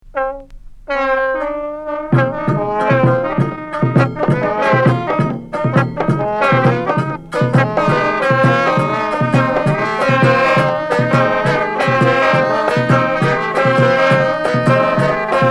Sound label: senufo music aphon